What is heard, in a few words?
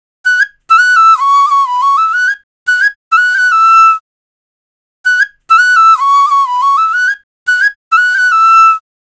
Hip Hop Flute Loop 100 BPM B